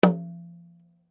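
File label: Drum Flat Skin forte, Drum heads isolated from drum shell; Musical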